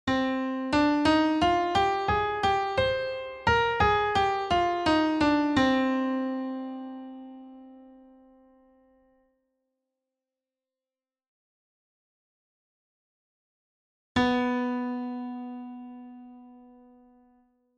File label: aeolian scale with perfect fourth leap to octave